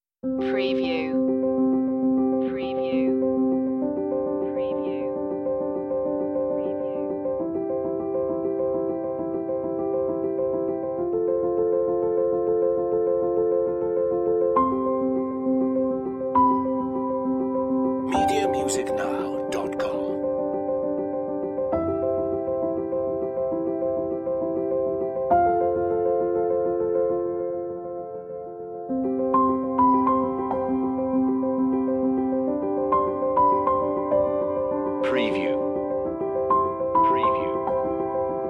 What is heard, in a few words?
waves piano; wedding